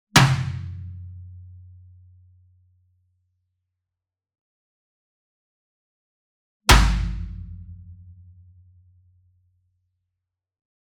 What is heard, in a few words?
Bass Drum Marching Hit Whip Beater Near; SINGLE DRUM; boom library; Cinematic Strikes Construction Kit